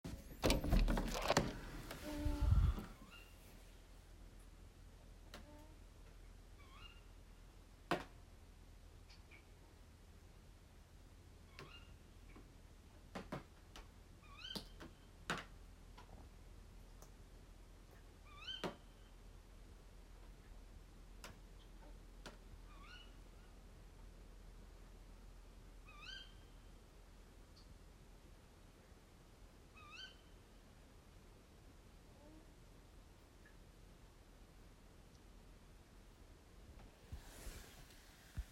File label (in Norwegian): kva fugl er dette